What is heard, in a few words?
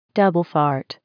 double fart